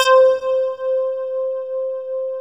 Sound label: ANALO BAS, ANAL BASS